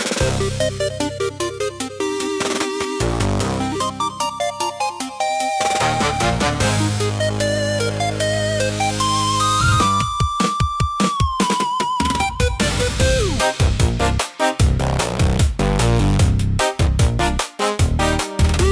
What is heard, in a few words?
rpg castle interior; tillys-quest